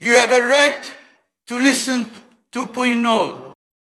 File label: jingle